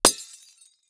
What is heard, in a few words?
Crash Light Bulb Smash On Concrete; crashes; AdobeCC